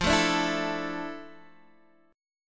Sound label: A Suspended 4th, F